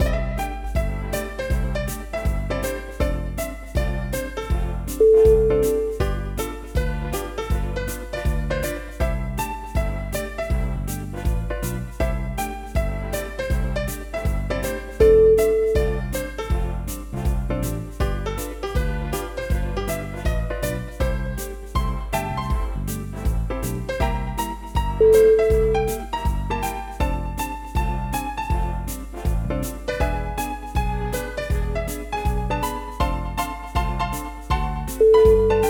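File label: funny rag, music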